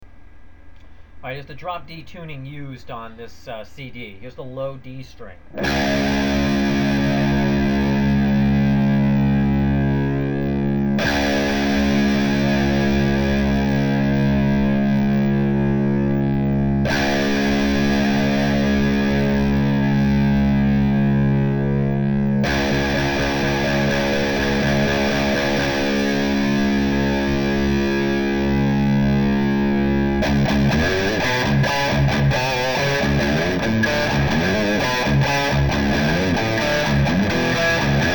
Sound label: drop d tuning, guitar